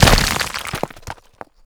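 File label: impact stone pillar debris; Explosions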